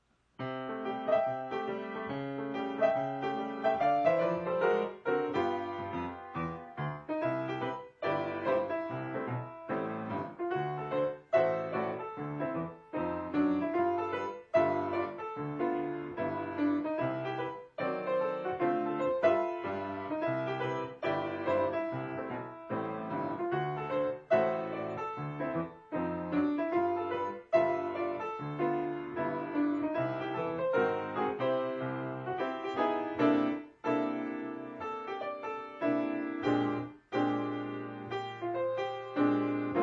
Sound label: piano